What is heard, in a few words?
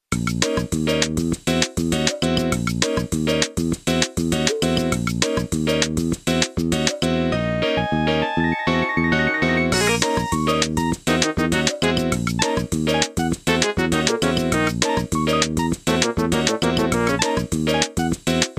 Sizzlin Nokia